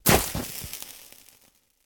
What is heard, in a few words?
fire impact; smite; poseidon